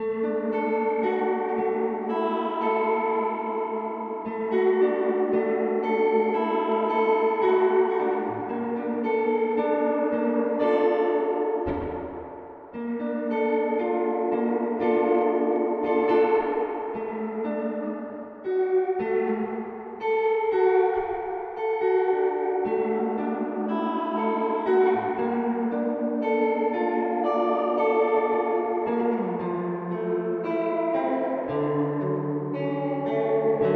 Hall 224; Golden Reverberator

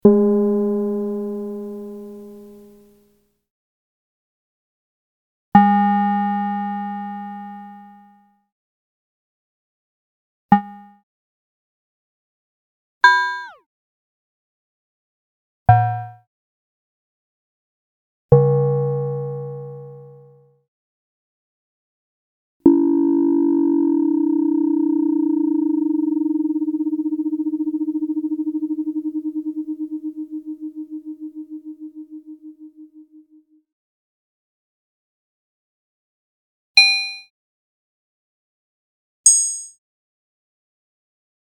EMS Logik

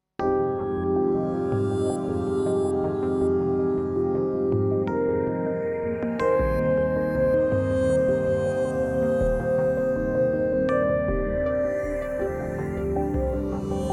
Montage

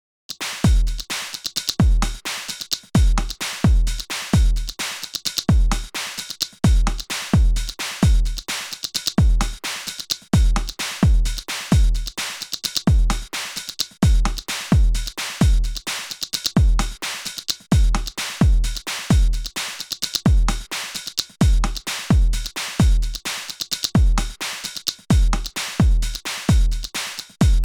DnB; MDrummer

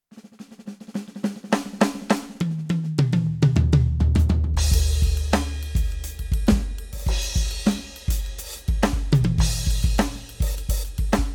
PEARL eMERGE Modern Soul Dry Ambience

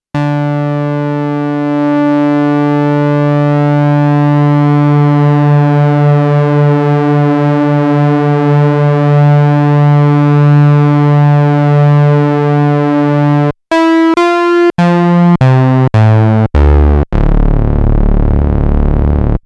MicroBrute UltraSaw

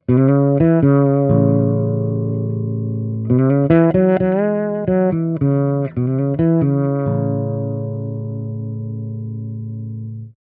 Neox 212T fretless

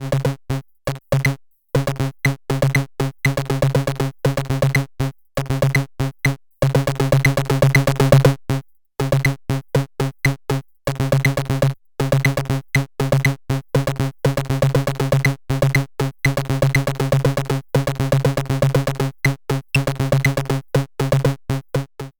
randomRhythm Stacked CV Sequence

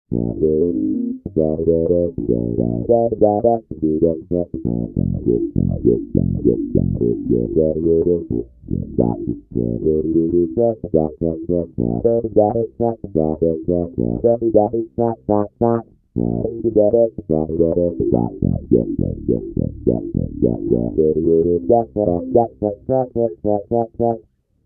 Octafilter hi Q hi Range full

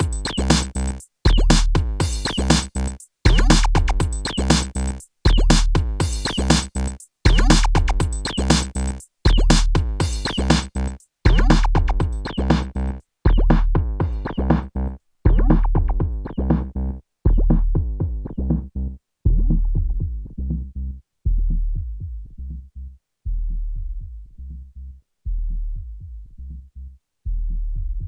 Jomox T Resonator II Filter

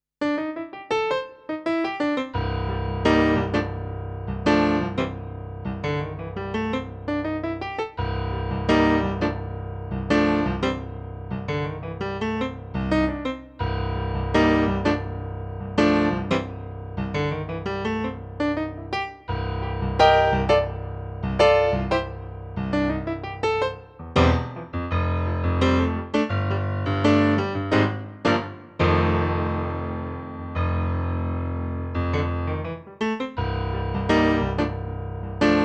Kawai ES7 Concert Grand

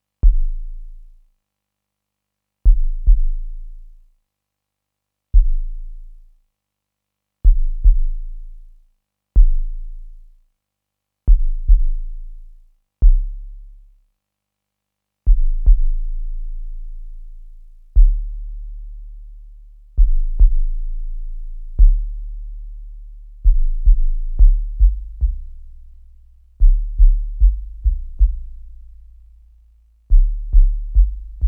Bm subs; Crave 80

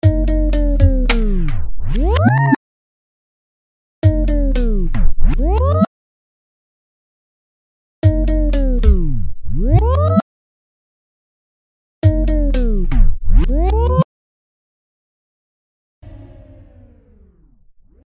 TimeShaper 2 Scratch

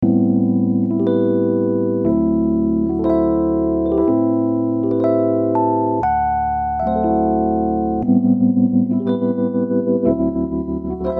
TriChorus Trem Rhodes

